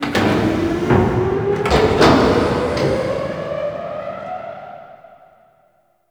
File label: Platform Startup; Kela De Thaym; Boss Intro